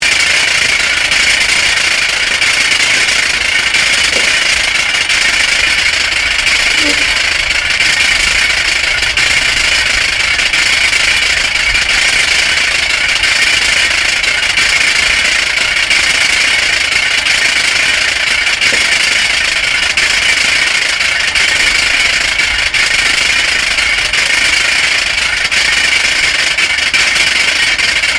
AIRCRAFT BOEING C-17 GLOBMASTER TURBINE FREE SPINNING, Globmaster turbine movement